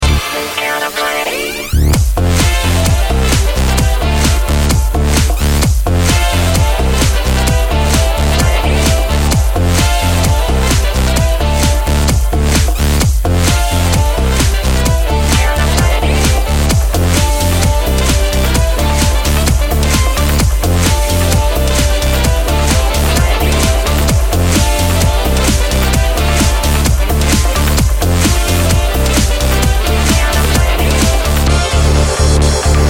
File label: Sax mix